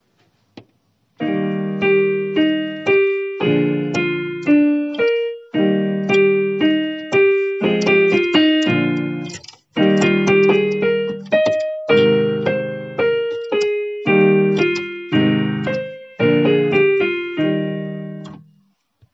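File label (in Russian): с аккомпанементом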